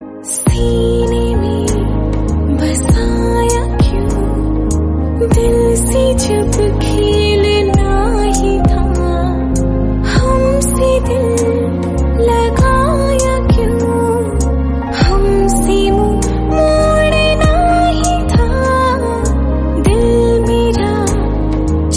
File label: FEMALE RINGTONE